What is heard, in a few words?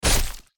sword